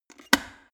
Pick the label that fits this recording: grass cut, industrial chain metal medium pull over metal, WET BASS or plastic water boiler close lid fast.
plastic water boiler close lid fast